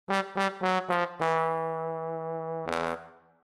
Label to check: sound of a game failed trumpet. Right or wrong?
Right